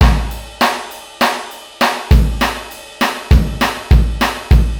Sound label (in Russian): Ballad D, сэмплы ударных rock pop